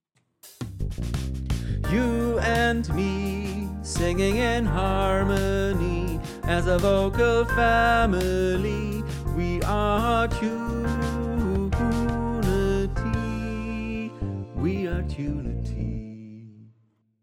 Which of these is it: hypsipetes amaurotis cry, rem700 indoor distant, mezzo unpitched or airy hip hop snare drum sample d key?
mezzo unpitched